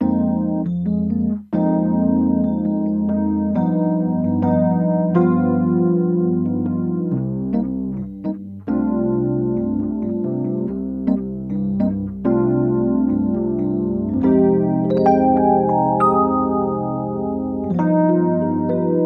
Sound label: Electric Piano BOSS Super Chorus CE1